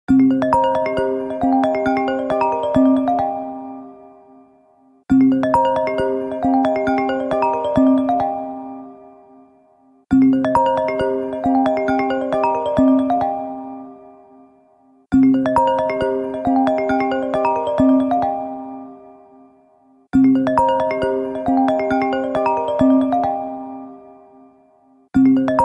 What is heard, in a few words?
ringtone